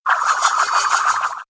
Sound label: frisbee gears